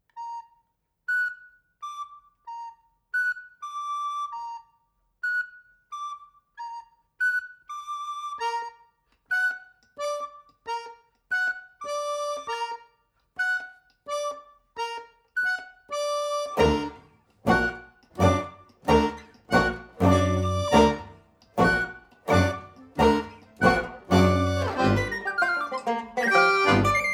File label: mazurka